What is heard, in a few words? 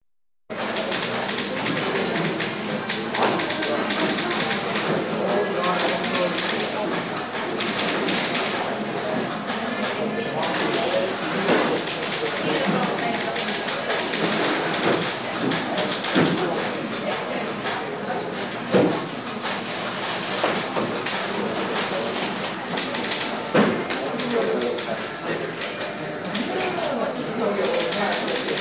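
bank sound general atmosphere printers voices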